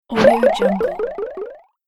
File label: swipe jump fall